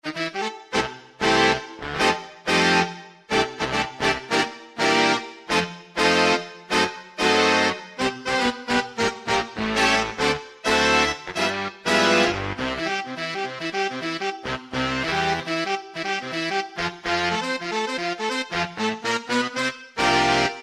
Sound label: Big Band, Alesis QS8; Factory Preset examples